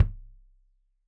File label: Kik Stereo; Deeply Sampled Drums; SMDrum